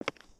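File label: Foot Concrete Sneaker Walk, footsteps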